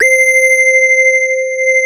TRI LEAD C5 R, KORG, Analog; USB Soundscan vol.49; Analog Fantasies; AKAI

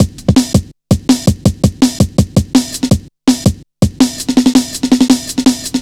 165, Drum Loops, Drum & Bass

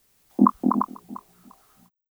Copshow FX; Copshow1975

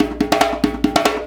HAND DRM; Spectrasonics; Heart of Africa 2

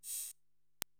HH KPR 55 Rev, Korg TR-Rack drum machine

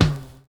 OLD LP, Kitch 70s; Univers Sons

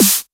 Main Room Snare; essential drumkit

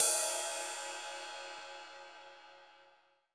RIDE; Best Service; Dance Mega Drums CD2

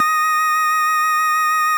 FARF, FARFISA

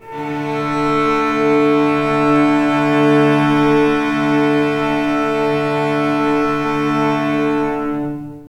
Dual Chamber A, Strings; Zero-G; Total Drum Bass

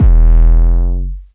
DRM BD Disto; Polyend; Plughugger

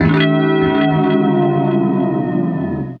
Drinngg E, Guitars; Total Drum&Bass

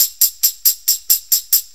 Tamb, Perc Loops; Drum Loops; 4-4; Sonic Foundry; Drum Tools

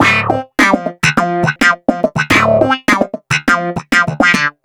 Wah Snap in D, Snap Bass; Basses

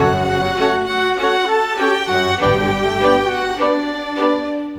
Orchestra, Rock Pop; Sonic Foundry (Sony Creative Software); Orchestral Series VOL-4 - Rock and Pop

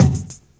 BD, Dance Mega Drums, HIP

